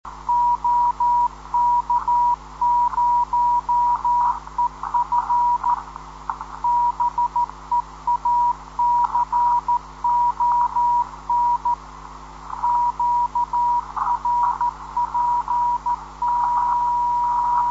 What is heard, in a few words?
10.133kHz, ndb